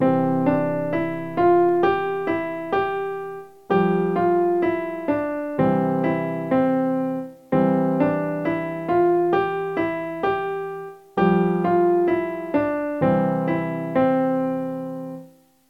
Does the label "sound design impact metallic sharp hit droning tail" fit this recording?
No